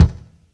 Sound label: rich lows steel kick drum D# key, Royality free kick tuned to the D# note